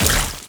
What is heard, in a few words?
death burst small